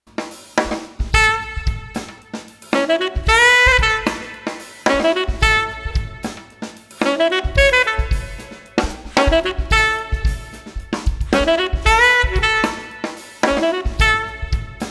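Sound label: Street Sax Trumpet Playful with Drums, 112 bpm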